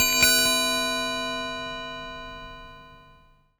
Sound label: Baoding Balls